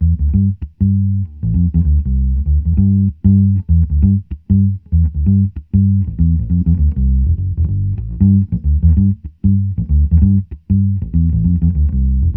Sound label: Bass; SM7